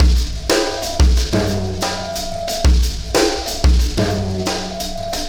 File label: Alien Influence Beat, Processed Drumkits